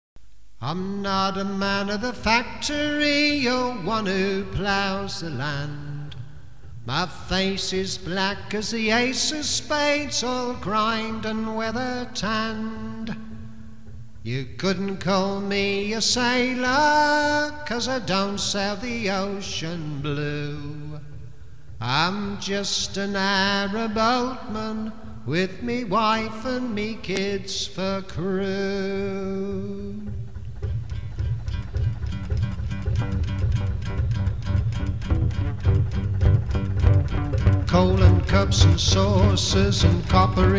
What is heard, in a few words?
narrowboatman blues